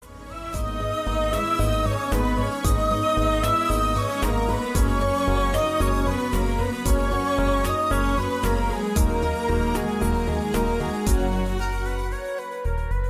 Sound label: heart broken instrumental ringtone